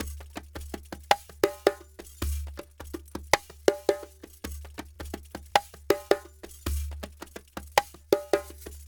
Djembe with Kessing, African

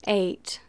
Female vocals and robo-vox

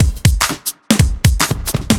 Trip Swing Mix B 120; off the grid

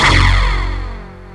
PPG WAVE, OLD SYNTHS

